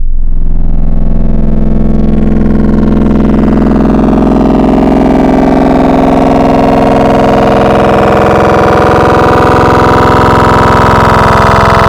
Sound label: Triangle Vocal, Wavetables; Cymatics; Secrets Wavetables; Serum